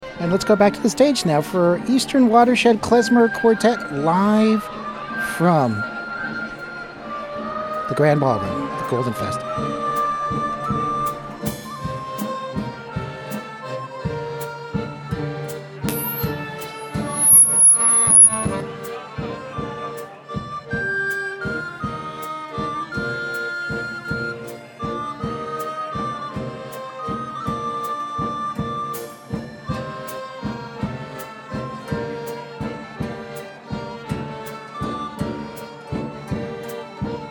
Klezmer Quartet, Live; Introduction Hora Mare; Live at the 2012 Golden Festival Main Stage; WFMU

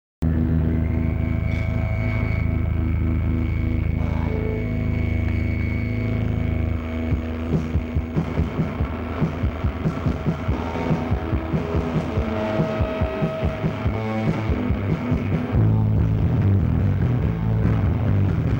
Jet live; Cricket Club 1993 live

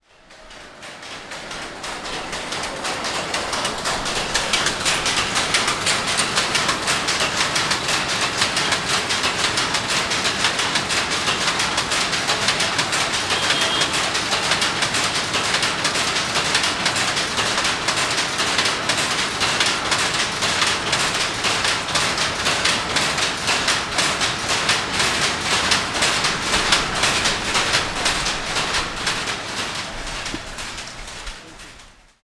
Sari Printer in Old Neighborhood Bangalore; Environments; Samuel Corwin; Selected Field Recordings from India and Nepal

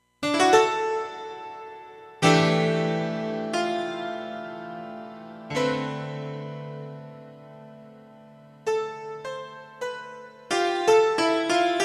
Piano

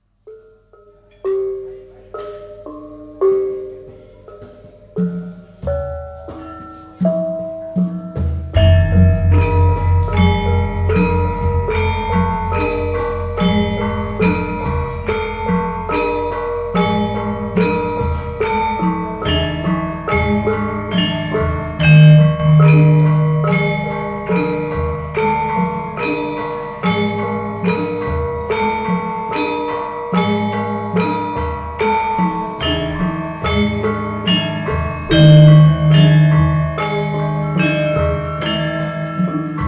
Gamelan Kraton, Indonesia; Les Cartes Postales Sonores; NOISE ON EARTH Vol5

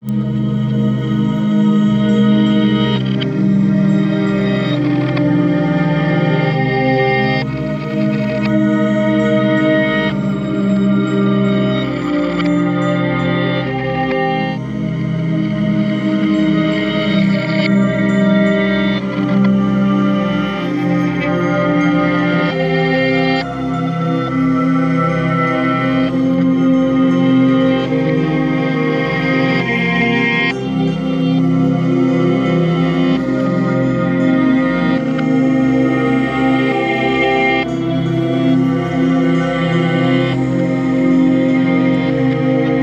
Somewhat Sad Backmasked Ghostly; CUES for film TV games etc

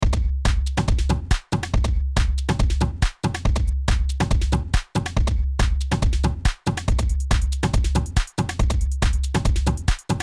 140 in the forrest; Loop Mania Sampler Pack

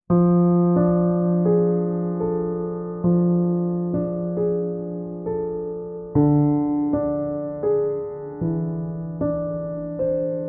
Loss Sad Lament Piano cello; CUES for Film TV Games etc SOLO PIANO

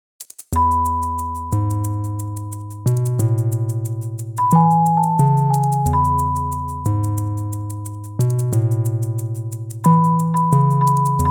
Marimba Vibraphone Chimes Bells

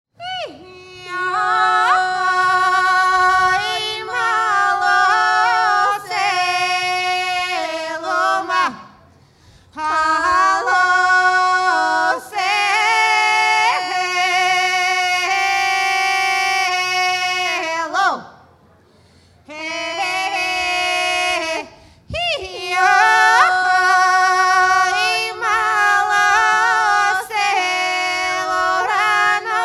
Bulgarian Womens Choir; Malo Selo; Live at the 2014 Golden Festival; WFMU

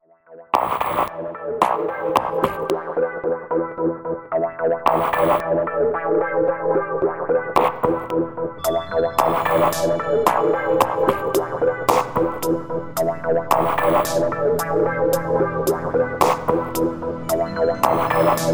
Instrumental

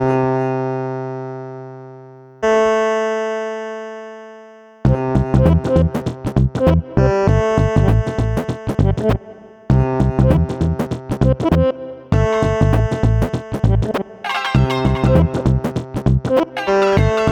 Harmonium and Saz Beat Jam

